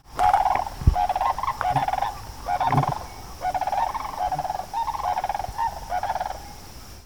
Sandhill Cranes

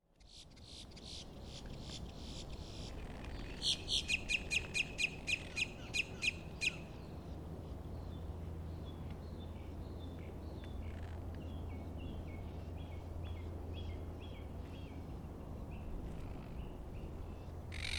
Boat tailed Grackle, FL; Markham Pk

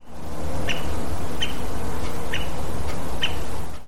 Am Three toed WP, CO

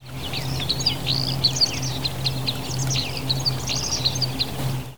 Red Crossbill song; Genesee Mtn Park

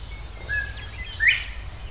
Northern Bobwhite; Morgan Cty CO

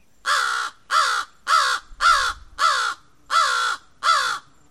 American Crow

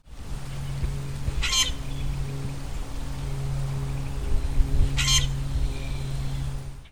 Co grackle; Babson Park

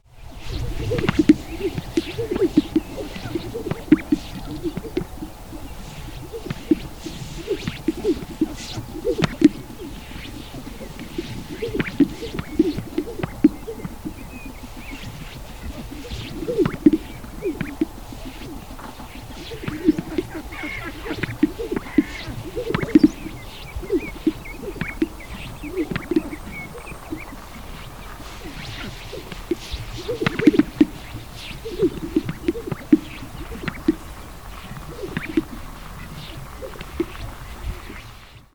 Greater Sage Grouse lek

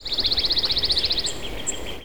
Pine Warbler

